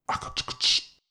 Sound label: Beatbox; Loops; Tonal; Vocal; Ableton Live 12 Lite; Core Library